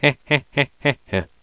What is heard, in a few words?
"hehe"